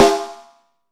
METAL, Snare; AKAI S6000 CD-ROM - Volume 3

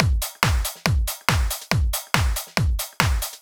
Kick Loop, 140bpm

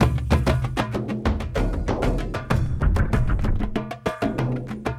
Dunk A Boong, Drums; Sonic Foundry (Sony Creative Software); Friendly Fire; Marc Steve

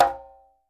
Quinto, Percussion

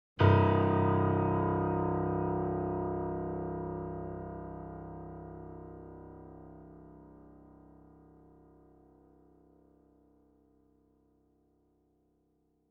C1 E1 A1 Grand Piano & Effects; Sound Sampling Collection VOL-3; Grand Piano 88

